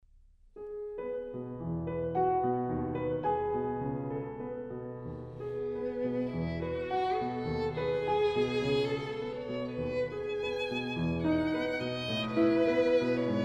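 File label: violin sonata; huber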